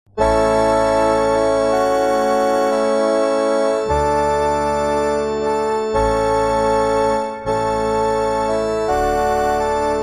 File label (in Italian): solo musica